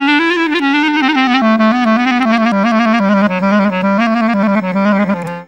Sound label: ORIENT CLARI; Best Service; World Colours